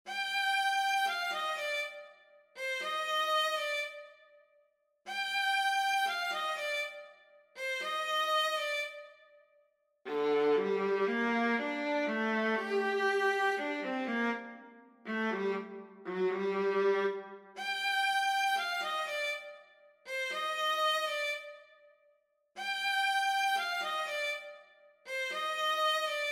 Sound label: Soliloquy for Viola